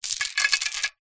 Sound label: chain thick movement wrapped around metal gate post; foley